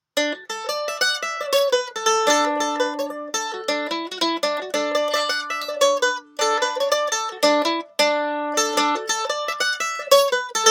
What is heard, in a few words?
Mandolin Pick Comparison